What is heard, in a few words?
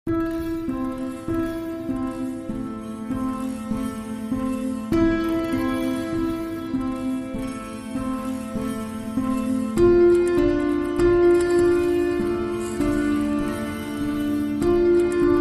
Piano Only